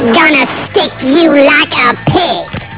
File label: pig